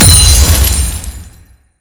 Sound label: gold acquisition; LoL